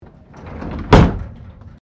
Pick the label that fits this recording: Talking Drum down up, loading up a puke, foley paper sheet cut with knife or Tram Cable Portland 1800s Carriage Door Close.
Tram Cable Portland 1800s Carriage Door Close